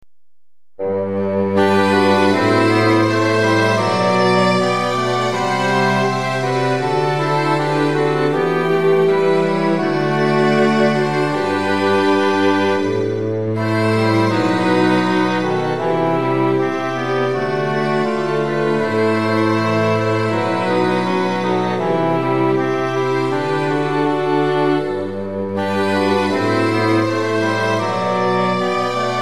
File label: Fl Ob EH Fg VC, Midi, Classic